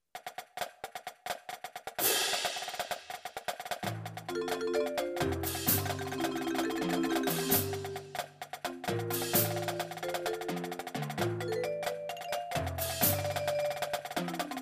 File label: percussion